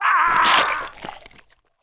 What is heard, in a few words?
soldier crush